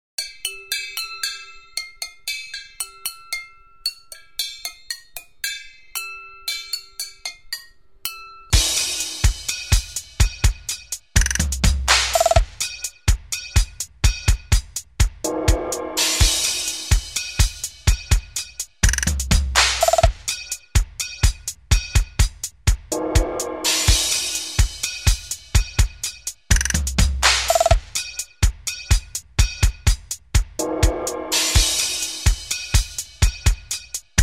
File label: groove; eps groove